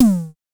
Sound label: DSM2 Tom; MPC DSM-2